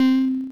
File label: synth, amiga med